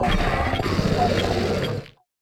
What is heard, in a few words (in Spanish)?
Grito de Ferrocuello; Gritos de Pokémon de la novena generación